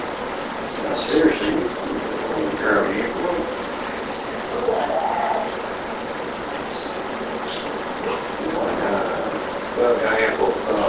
Unexplained Sound Amplified

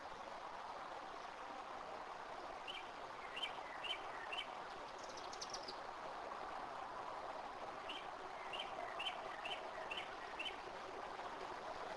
unexplained noise; Case 023 Major Graham Mansion; Slave House; Olympus

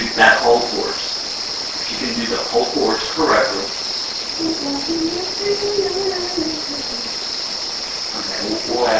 Bed Room Unexplained humming AMPLIFIED

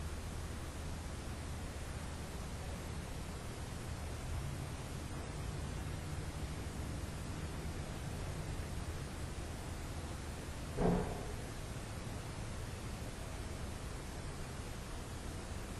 Unexplained knock; Case 023 Major Graham Mansion; Childrens Room; Olympus Plug

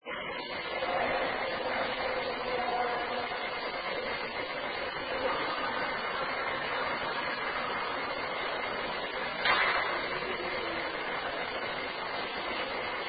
Second Floor Unexplained knock; Case 024 Pocahontas Fuel Building II

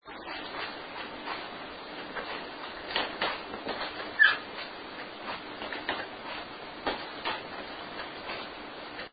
Living Room sounds like a dog

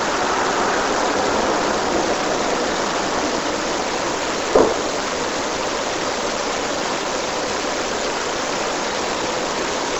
Unexplained 1st (Knock) 2nd Floor Mid section

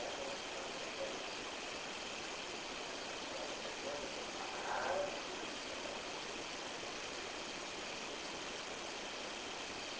Unexplained Breath

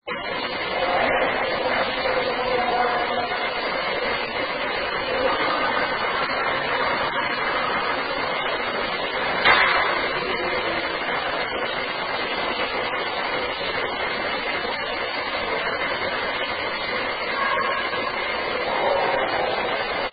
Second Floor Unexplained knock and; Case 024 Pocahontas Fuel Building II

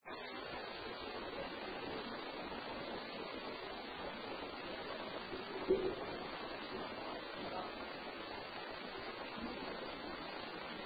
UNEXPLAINED KNOCKING YELL; Case 023 Major Graham Mansion; Basement

